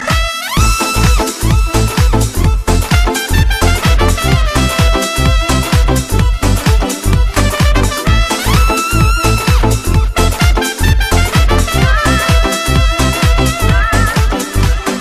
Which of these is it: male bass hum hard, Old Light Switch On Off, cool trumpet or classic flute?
cool trumpet